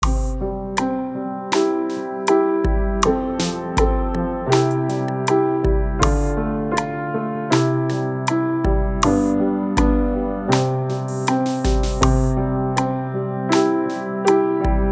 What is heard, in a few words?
twinkle remix